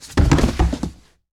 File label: Bodyfall Wood; SFX Kit; Bodyfalls